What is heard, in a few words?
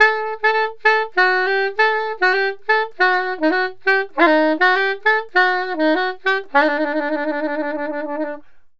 SAX, Balkanish Traditions